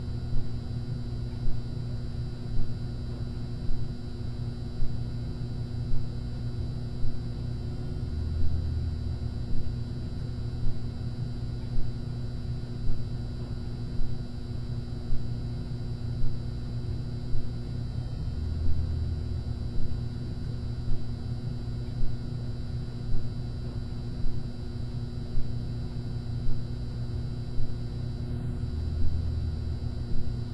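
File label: ambience flor; midnight guns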